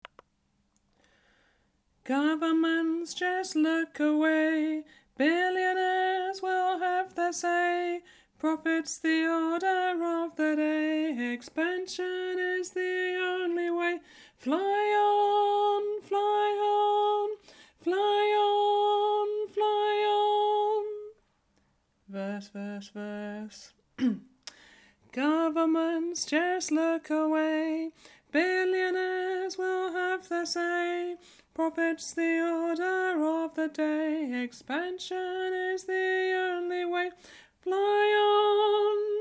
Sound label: alto